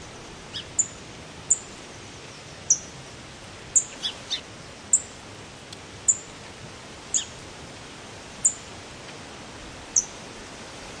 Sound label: forest ambience; Zoo and Nature